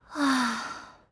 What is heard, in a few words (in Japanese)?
はあ…